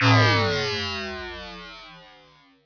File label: jump; tron